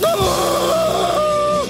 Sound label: scream; lemon